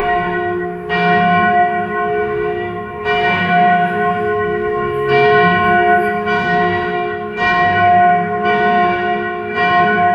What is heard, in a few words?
church sound inside; architecture